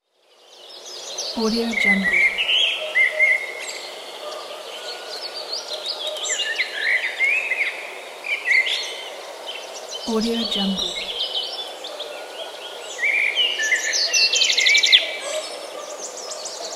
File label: birds in forest